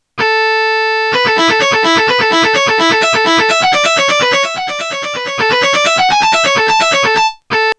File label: fuzz